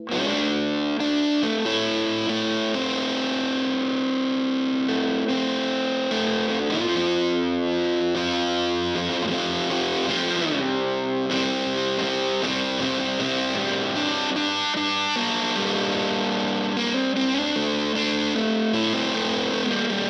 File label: JHS Cheese Ball Mode 2